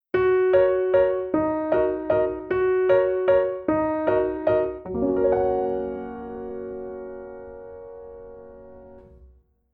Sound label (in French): son isole piano